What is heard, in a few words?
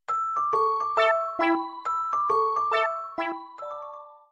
Tone